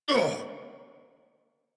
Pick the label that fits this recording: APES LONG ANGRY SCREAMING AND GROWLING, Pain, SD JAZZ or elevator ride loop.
Pain